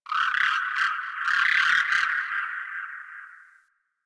Landscape Distant Birds, Ambience; One Offs; Warframe; Venus Open World

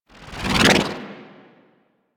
Golden Band Staff Expand; Warframe; MonkeyKing

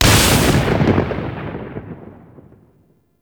Lightning Impact; Enemies; Abilities; Warframe; Teralyst; Rainalyst

